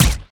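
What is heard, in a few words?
Laser gun Single; Loki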